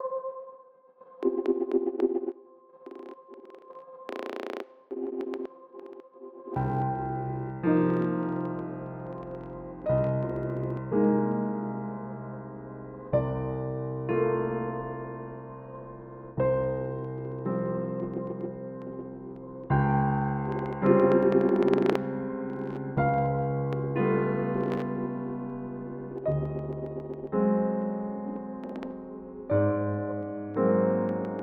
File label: piano